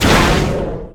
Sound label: Fire Flame Burst; Destruction